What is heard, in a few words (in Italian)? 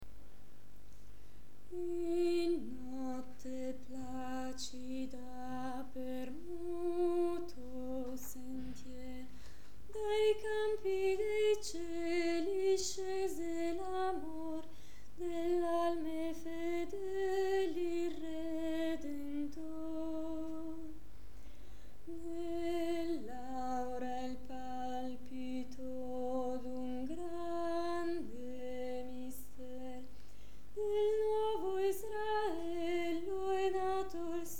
contralto